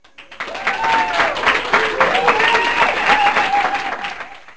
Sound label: Claps; LG; U8110